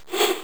throwing bow shoot; Minetest; VoxeLibre

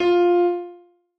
noteblock f; VoxeLibre; REDSTONE; mesecons

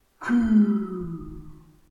blaze died; mobs; mobs mc

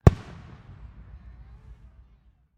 bows firework soft; Minetest; VoxeLibre

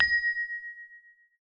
noteblock chime; VoxeLibre; REDSTONE; mesecons

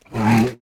bear hurt; mobs; mobs mc

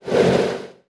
end teleport; Minetest; VoxeLibre

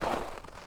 snow soft footstep; mcl sounds

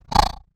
spider attack; Minetest; VoxeLibre; mobs mc

